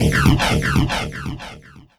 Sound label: Criket Fill, Esoterik Beatz; Cricket